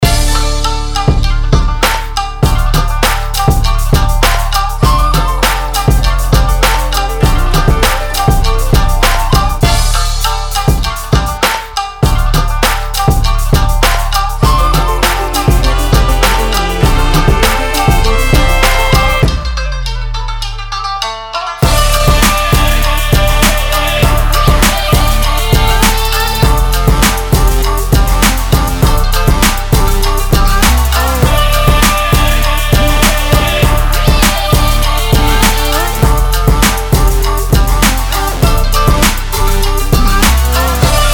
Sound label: koto